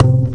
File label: Upright Bass Notes